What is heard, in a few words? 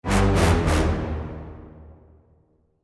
low dramatic; soundscrate